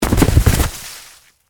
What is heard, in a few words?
Object Roll Snow, Weather, Object, Roll, In, Snow; BlastWave